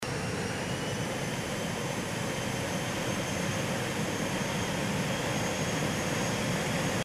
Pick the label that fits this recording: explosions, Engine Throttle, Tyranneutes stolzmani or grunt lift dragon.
Engine Throttle